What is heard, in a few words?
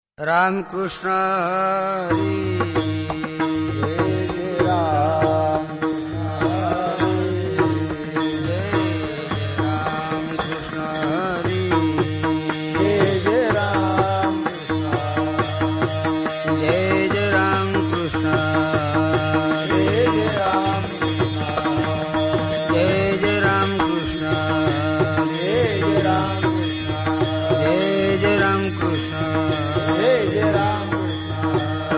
Ram Krushna Hari bhajan; kakad arati bhajni malika